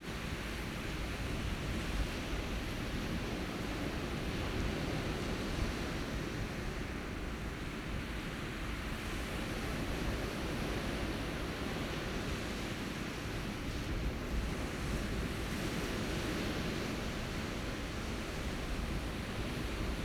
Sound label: Ambience; FMOD; Enviroment